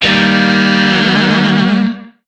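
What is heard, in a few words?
Shawzin Minor Chord; Warframe; Lizzie Shawzin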